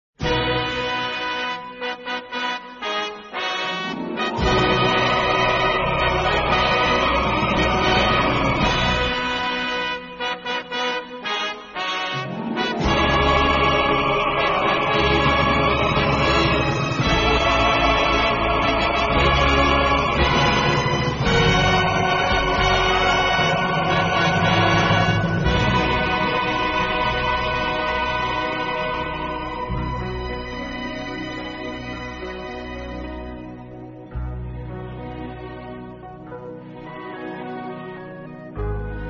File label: game Bgm; jiandangbainian